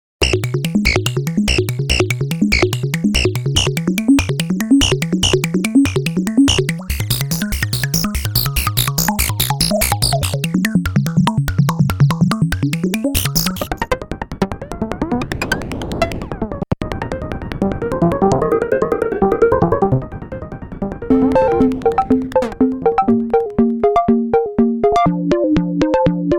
tuned perc bell-like; mopho

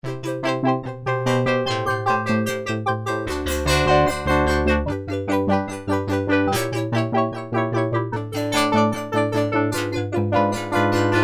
simple wave seq

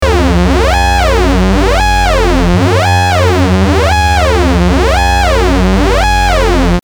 sync sweep, evolver

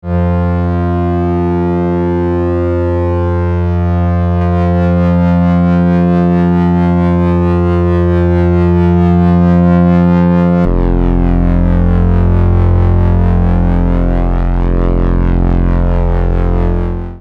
pulse width mod

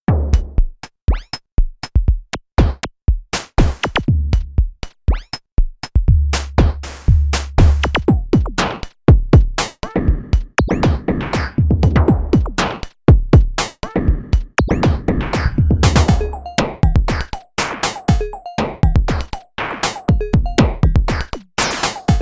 mopho drums

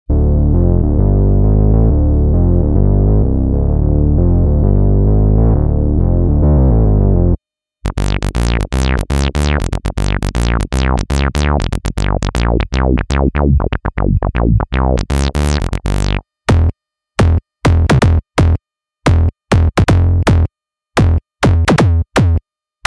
mopho basses

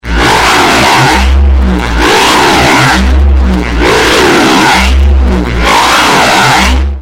growl LOUD, evolver

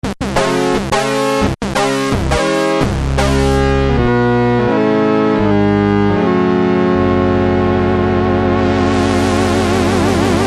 poly sync, evolver

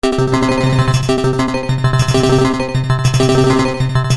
seven step, Evolver

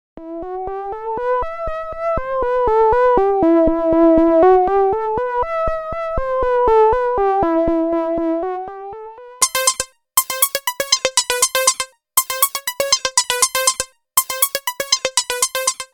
hip hop r&b; mopho

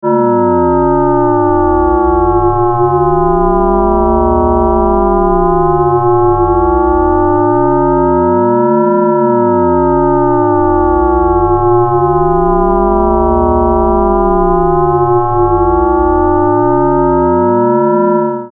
Filter Drone, evolver

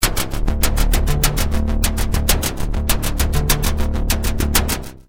noise puffs, evolver

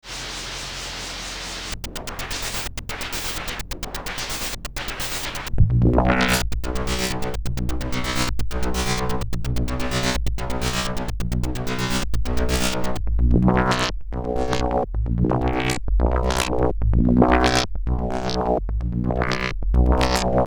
noise sequence, evolver

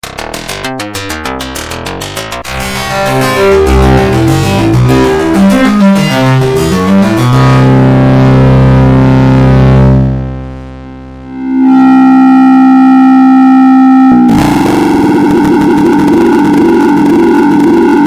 plucked, evolver

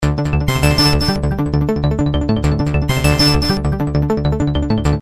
wav seq, Evolver

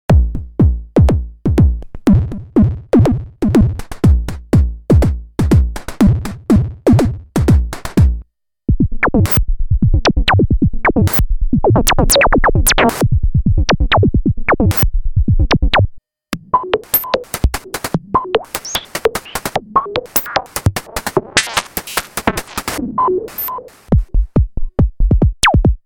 percussion sequences; mopho

